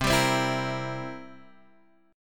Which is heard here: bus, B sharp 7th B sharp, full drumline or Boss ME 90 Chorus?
B sharp 7th B sharp